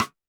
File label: Snare, Roland TD-20